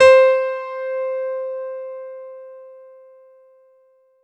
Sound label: C4 DANCE, ANALOG SEQ, Roland JV 2080